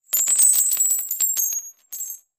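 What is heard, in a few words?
Cup Lrg Washers Dumped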